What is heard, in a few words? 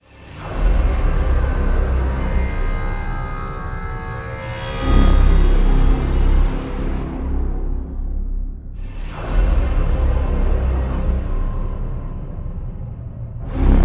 Thriller